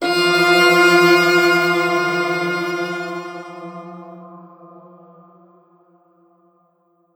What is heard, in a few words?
Piano Walkway Chorus F3; super crazy rhythm castle